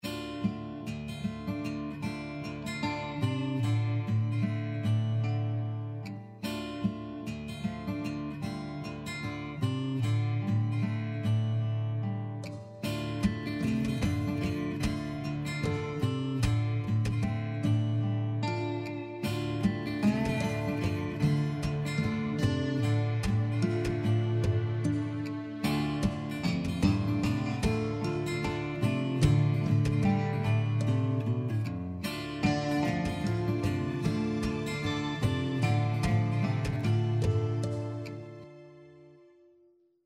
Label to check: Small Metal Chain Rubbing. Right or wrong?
Wrong